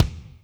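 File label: kick 22' Power Room, Alesis D4 drum machine; 300 Drum Machines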